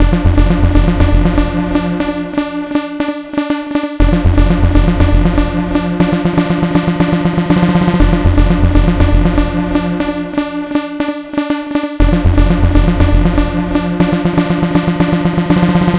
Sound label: Trance Synth; Sonic Foundry (Sony Creative Software); Trance NRG VOL-2; Trance Synths